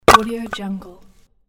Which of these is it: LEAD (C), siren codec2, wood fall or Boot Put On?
wood fall